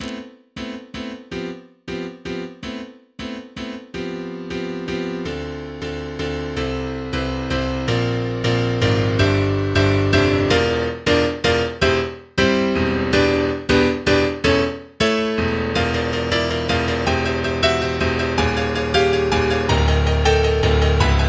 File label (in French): exercise pour piano; Petit exercise pour piano No 6